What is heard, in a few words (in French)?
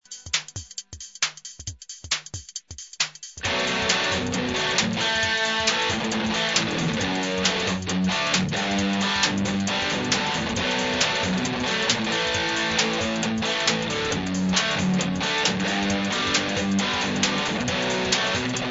4 accords